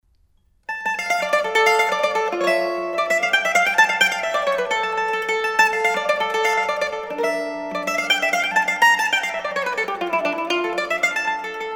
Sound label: Suite Courante, mandolin